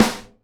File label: SNARE DAMP, Live Snares